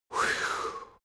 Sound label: male relief sigh; soundpool